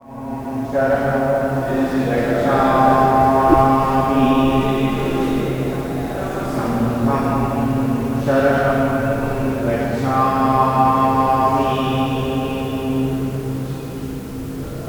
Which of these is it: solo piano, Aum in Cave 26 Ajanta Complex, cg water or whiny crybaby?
Aum in Cave 26 Ajanta Complex